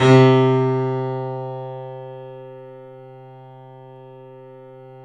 The Piano; InVision Interactive - The Piano

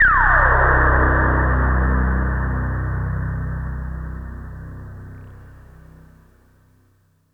Tone, IDM; Sonic Foundry (Sony Creative Software); Twine-IDM

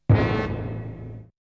Hit; ZERO G - Datafile DISC 1

